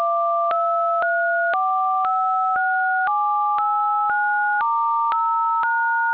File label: dtmf